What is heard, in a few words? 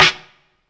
snare